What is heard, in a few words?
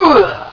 Sarge Death; BOOM